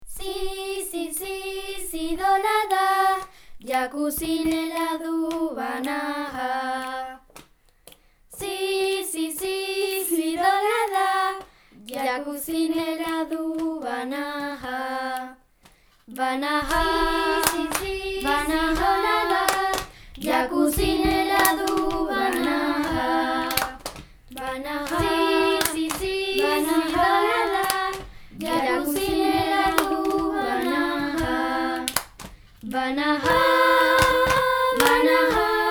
canon banaha

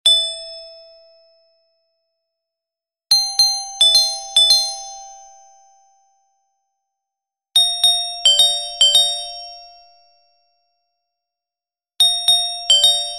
RUMBA Carillon Contralto

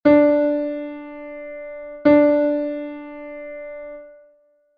Unisono; un unísono: dúas notas iguais

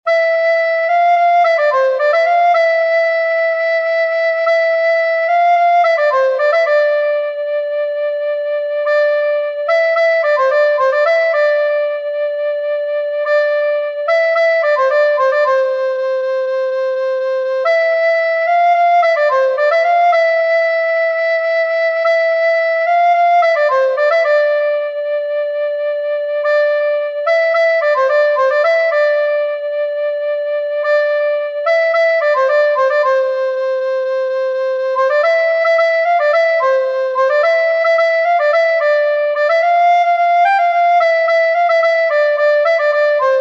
Rumba cinco marinheiros, frauta

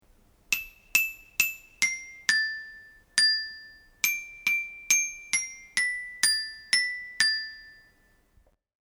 melodia menor

